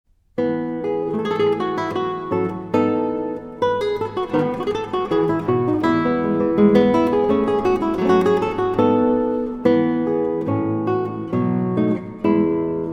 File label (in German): gitarre bach bwv 1006 gavotte; jan zacek